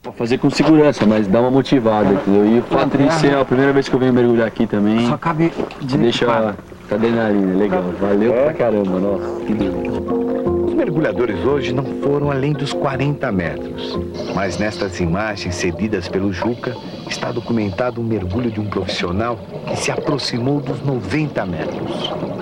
radio + tv; Brasilien; Fieldrecordings

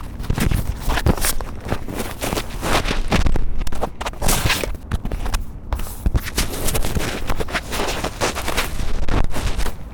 Mikro Fehler; London; Walkman Performance

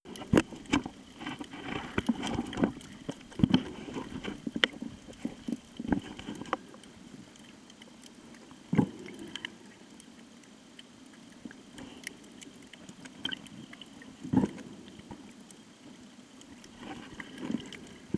Original Video Ton, Playa Lagun, Curacao; Fieldrecordings

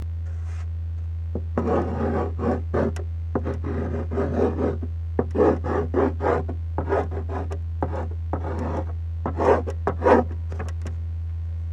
plaste kuli

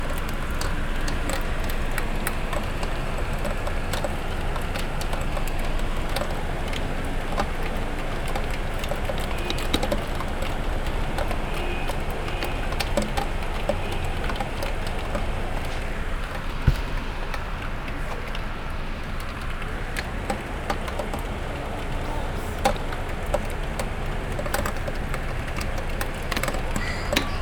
Regen Draussen, Brasilien

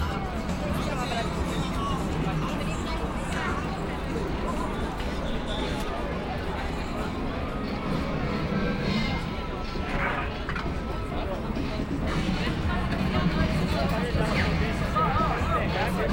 aufm Markt, Fieldrecordings Brasilien

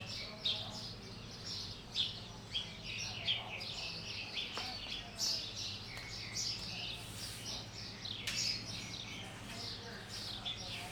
Rode NT4, in der Kueche am Fenster; Curacao; Fieldrecordings

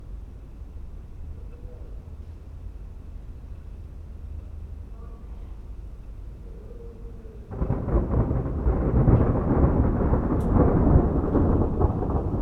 Gewitter mit Regen, ca 3 Uhr morgens; Fieldrecordings

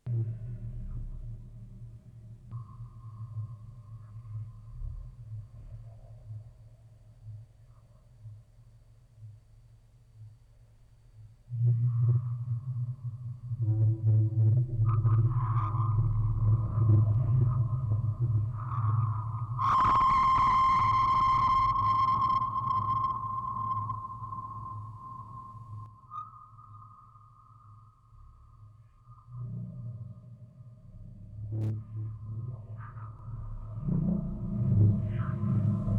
Aufnahme Tape out, Spieluhren-Quartett mit Tonband; Vocoder und Fieldrecording

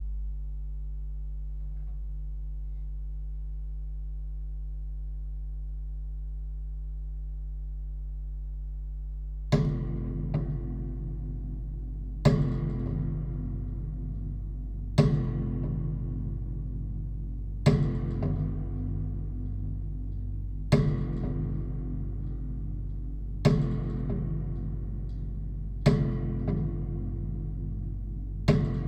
Hall Stereo, Drehorgel; grafische Komposition 'sand' für Drehorgel Remix 2 (Making Off)

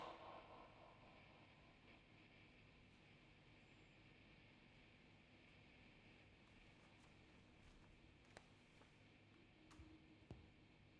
Aufnahme Rode NT4, Spieluhren-Quartett mit Tonband, Vocoder und Fieldrecording

